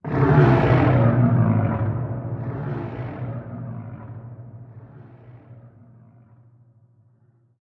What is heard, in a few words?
Creature